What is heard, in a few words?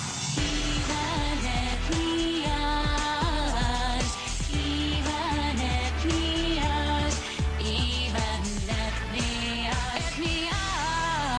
music